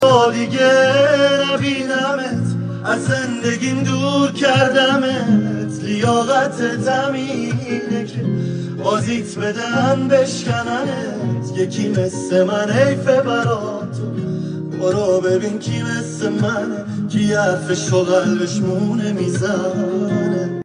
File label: Guitar